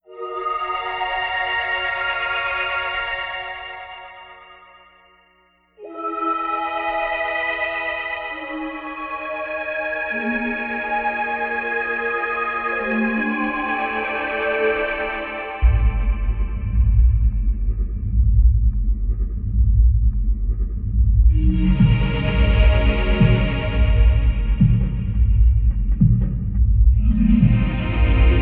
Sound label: Atmosphere; Hitman Codename 47; Hitman 2 Silent Assassin